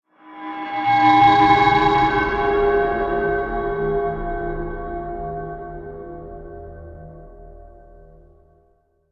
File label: Sting Rabbit Hole; Brand X Music; Brand X Music Catalogue - Editor's Essentials